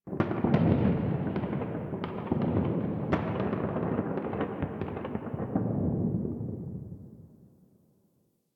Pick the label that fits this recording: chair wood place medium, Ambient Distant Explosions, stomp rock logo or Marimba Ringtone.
Ambient Distant Explosions